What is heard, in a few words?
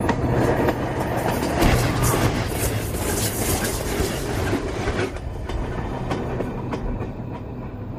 Diesel Locomotive, switches